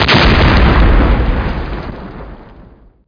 medium explosion; SpecOps2 MPlayer Demo

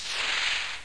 X OPEN; James Bond 007 - The Ultimate Dossier

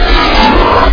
dodge; matrixhl

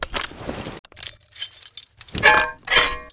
panzer reload, weapons foley; Spearhead Demo

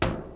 thunk; The Living Tradition of Yup'ik Masks

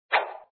Swish

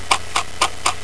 Fast clock; Prometheus 1.0

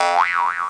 boing; mazedoom

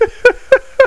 Other Laugh; SpacewarH40b

